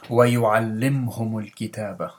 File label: hum